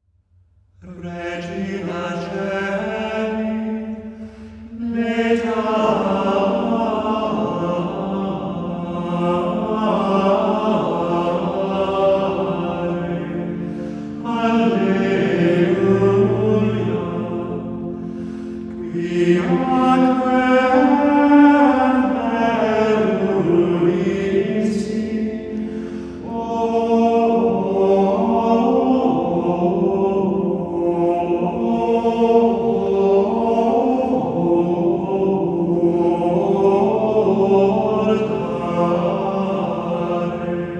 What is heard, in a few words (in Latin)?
regina caeli solemn, gregorian chant